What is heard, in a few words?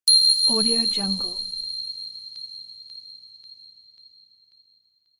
bells sound